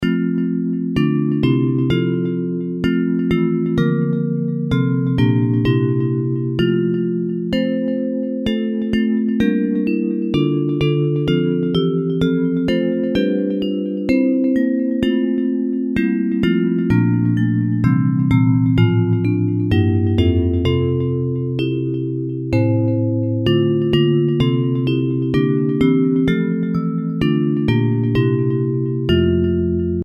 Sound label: Bells